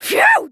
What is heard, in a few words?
phew